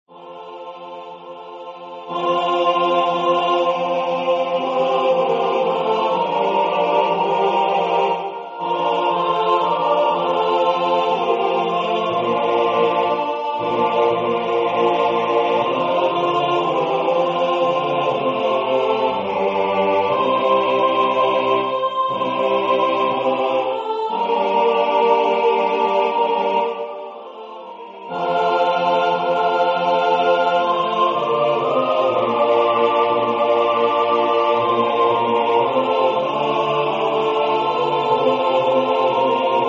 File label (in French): tutti choeur; 7Paroles; Pater